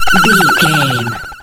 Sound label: retro magic spell cast